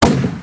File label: bullet; 夜鹰行动; AircraftAttack